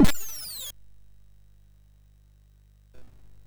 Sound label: Power of Black Bandit, MOS6581R2